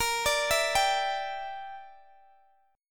A sharp 6th Flat 5th, Guitar DADGAD

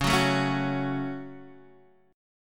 Db Suspended 4th Sharp 5th Db Guitar Standard; x,4,4,2,2,2; strummed

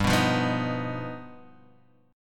G Augmented 7th, Guitar Drop D

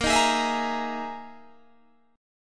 E Major 7th Flat 5th chord

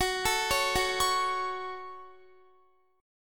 Gb Minor, Guitar; arpeggiated; x,21,19,18,18,20

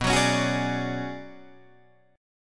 B Minor 6th Add 9th B, Guitar, Drop D

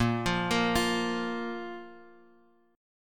A sharp 5th A sharp; A#5 Chord {X 1 3 3 X 1} arpeggiated

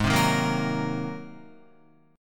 G sharp 9th G sharp Guitar; G#9 strummed; JGuitar

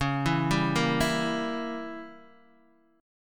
Db Diminished 7th Db down Guitar Open D

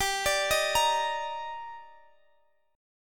Eb Major 7th G

